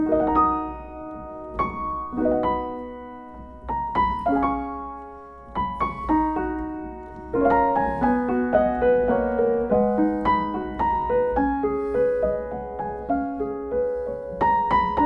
Kawai CE 7, piano

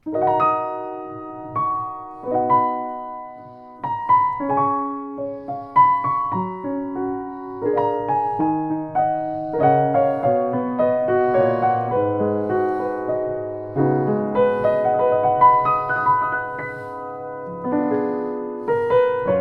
Yamaha P121, piano

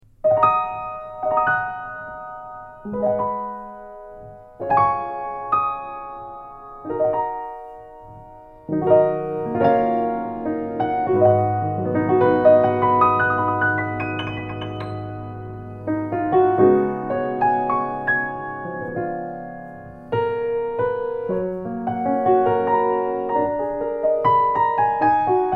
Grotrian Steinweg 160, piano

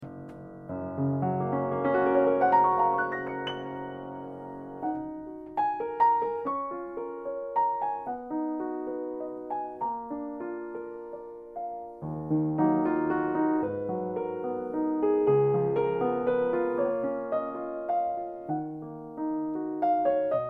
Perzina 129, piano

